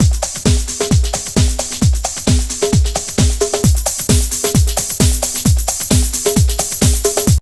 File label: DRUM, 132; Megabass Remix! 2